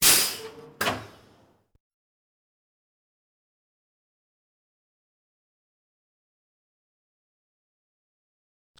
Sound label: Roller Coaster Brake Release